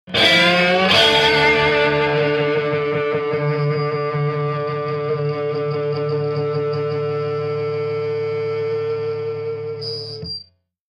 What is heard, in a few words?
Elect Guitar Feedbac, guitars